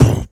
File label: Beatbox Kick, Human Beatbox; Vengeance Samples; Special Sounds